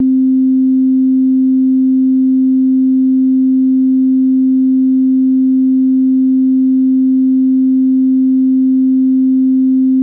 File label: unused jingle; Controller Test Cartridge